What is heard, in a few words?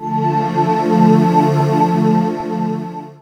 sweep, House FX oneshots; E Lab; XTc Files of House